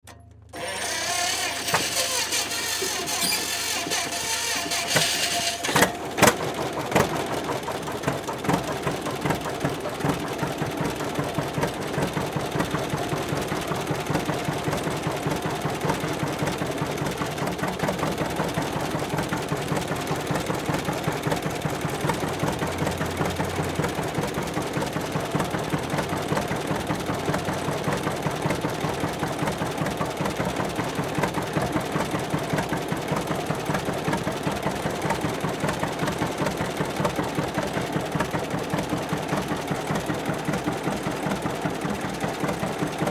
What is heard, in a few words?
Tractor On Run Off, Tractor Start Up Run Shut Off; Tractor 1945 John Deer Engine Start Up Idle Shut Off; Blastwave